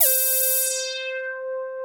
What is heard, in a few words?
HARD LEAD C5, PPG, analog; USB Soundscan vol.49 - Analog Fantasies; AKAI